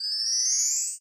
blessed; Samsung Galaxy S10; AvatarEmojiSticker Beyond

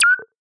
Funny; Pantech Discover P9090; FunnySet

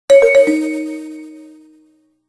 Alert Moving; HTC T-Mobile Shadow

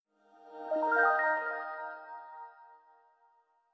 Message, Nokia phone tones; 500

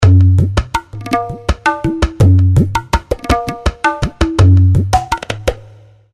Tabla; Sony Ericsson; Tablaking; PlusFourSix; Sania (T650)

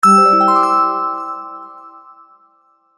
Message; Nokia; 2760b

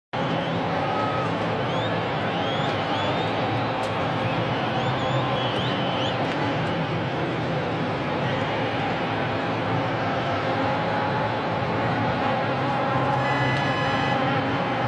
Football background music; Nokia; Audio themes

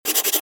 Key Tone

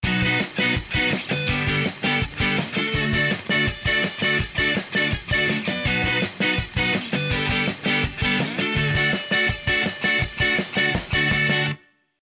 Rock scissors; Nokia; 5030b

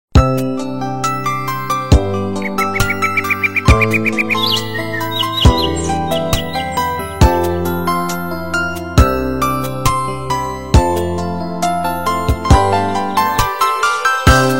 Canon

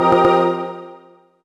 Notifier Et Voila, BlackBerry 9000 Bold

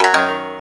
gayageum, keytone

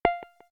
Video Stop, ui; Coolpad; Tiptop-N2M

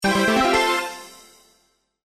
New Chat Message; Sony Ericsson; P900

